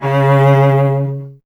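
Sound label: strings cello C#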